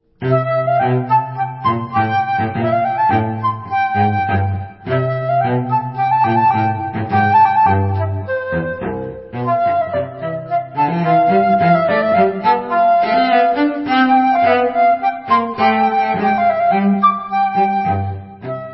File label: Cello i flauta duo